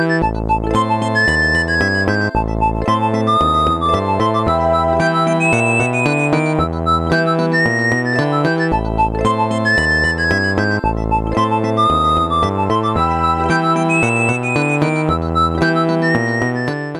Nokia Tune; Espionage

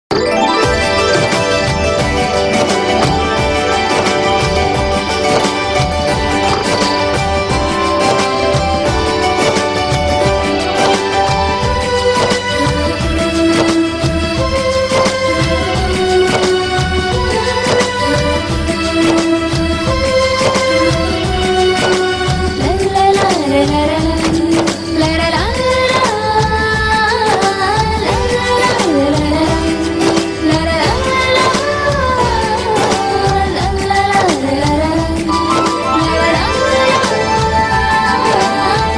BG Tune Theme Tune; Tv Serials; Star Plus

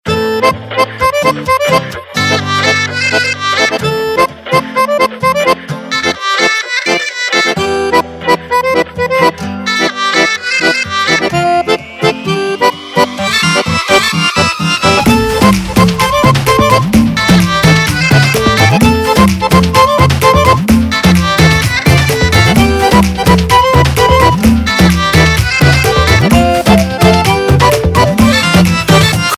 Slow Theme Ringtone; Bollywood; Tubelight

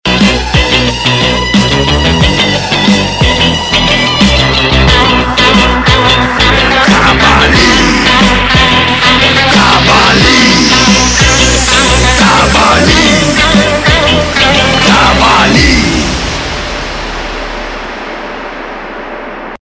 Electro Music Ringtone; Bollywood; Kabali

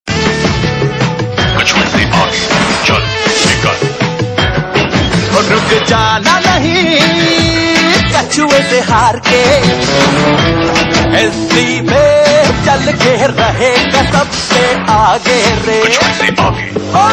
Yamaha New Ad Tone; Tv Advertisement Tones

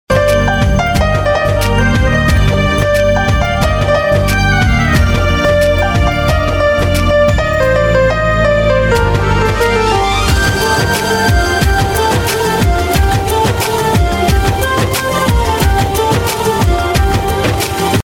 Instrumental Tone

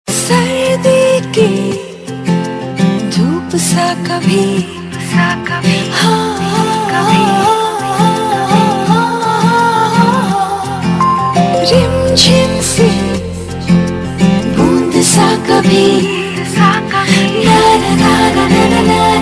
Female; TV Serial Tone; Star One

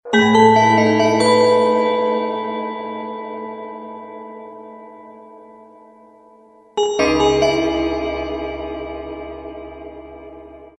3d Bells Sms

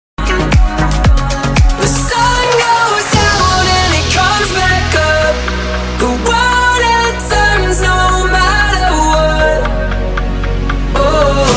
Edm English

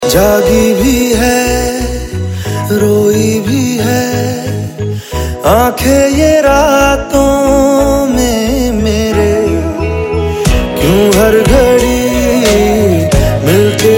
Male Ringtone

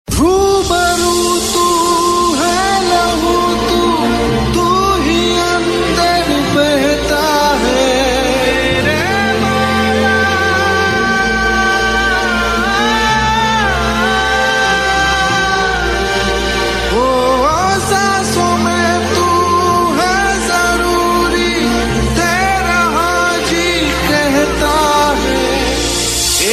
Sad Tune; TV Serial Tone; Life OK; Ek Boond Ishq

